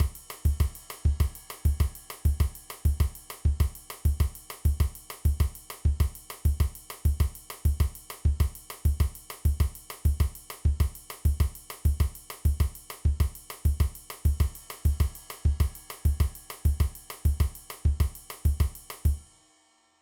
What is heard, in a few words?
Samba Beat